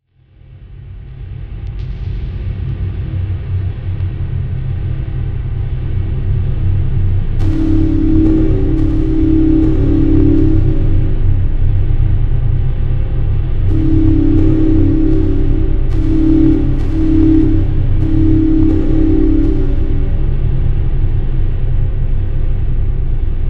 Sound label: train in a tunnel